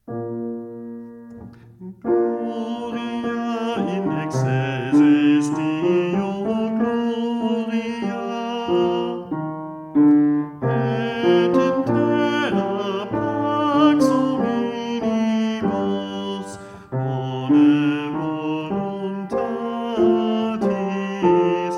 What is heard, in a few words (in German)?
Bass